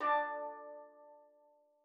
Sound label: D3, STEELDRUMS; Xsample VOL-4